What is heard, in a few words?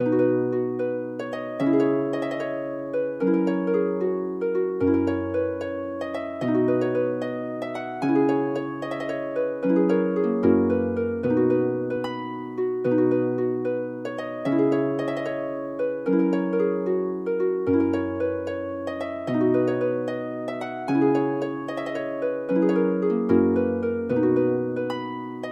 for harp